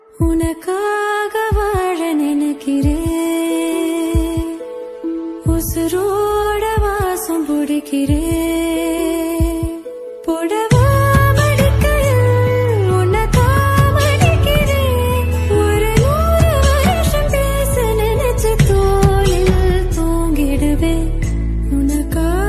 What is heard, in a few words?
Love Song Ringtone